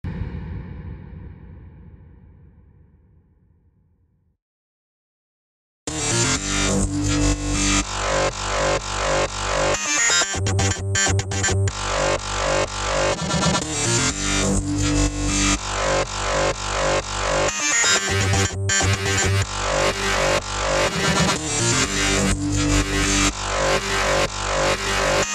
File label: Electro-bot